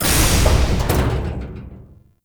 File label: Portal Entrance Door Open; Octopede; Warframe; Duviri Murmur; Ambience